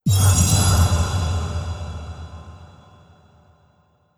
Medieval UI Chime; Medieval U I Chime 02

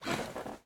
Sofa Sit; Sittable

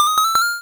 power up; ascent of kings; 3DS

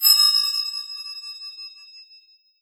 Metallic Glimmer Drone

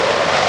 Winter games; Sfx Wintergames

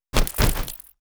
Dodge; Mars Sortie

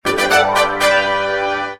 Lucky draw Reward

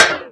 Universal Block Metalhit; Superbrawl; Nickelodeon Super Brawl Universe; Android

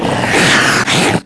Pig Attact

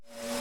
Void Suck

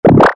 shot water pop; starbites

